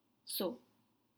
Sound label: seau bucket